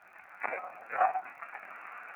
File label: dog bark